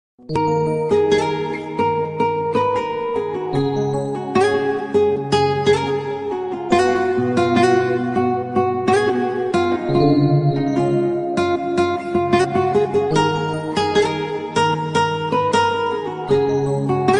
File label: guitar; murder 2; blues